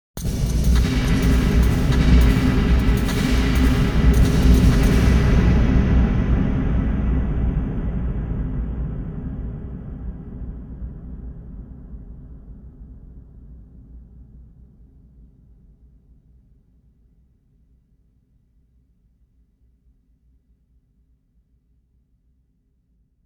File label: drum, abbey reconstruction; St Marys